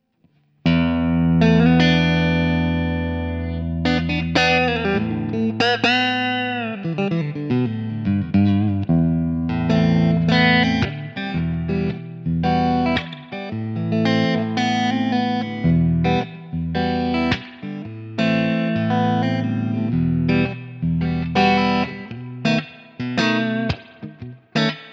Danelectro 59M NOS Clean Fingerstyle

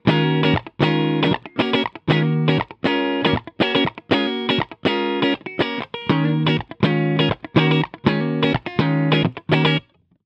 Tele Neck; AVID Fasttrack; Apogee Duet; e606

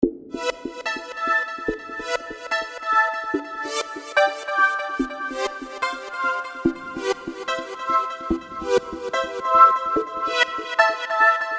Pulsar

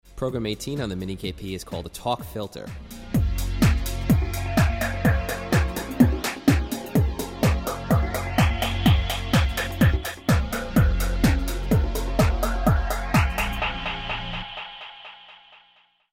Talk Filter

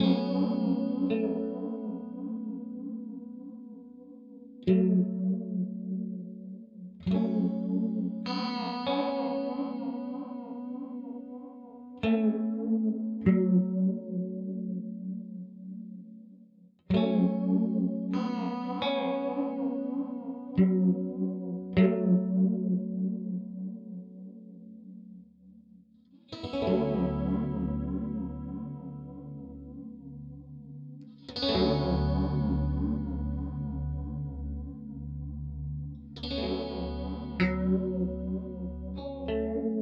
Zelzah 6 Stage 4 Stage; Strymon

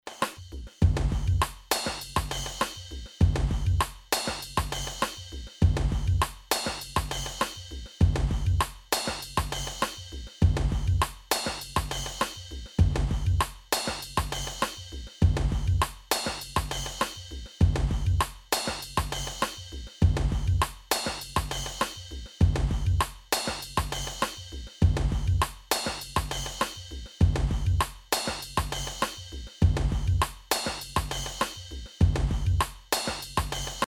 dub kit; NI Battery 4

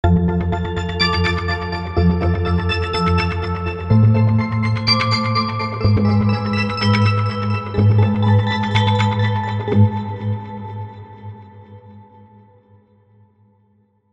Tape Ticking; Heavyocity Mosaic

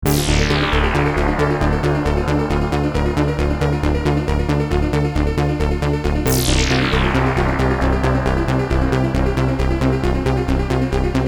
Trance Split; Dual Super Gemini; with Super 6

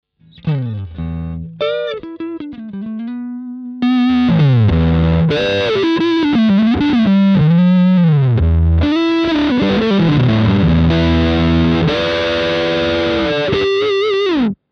Gain mid LEAD; BM20 ULTRA; Ge Si

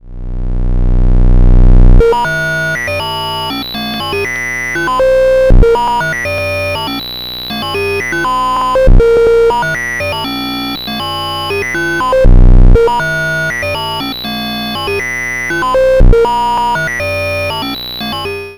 Error Instruments Space Station 8

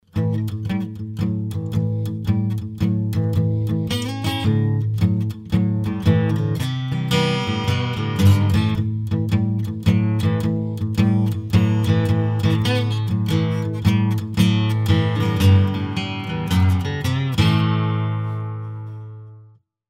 major Blues

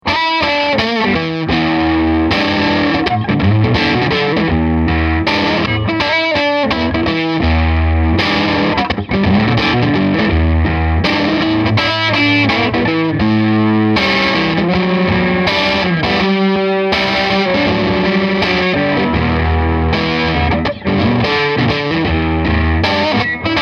Crunch; Koch Amps Little Gristle

